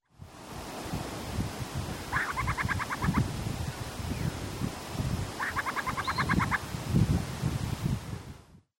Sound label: Burrowing Owl Athene cunicularia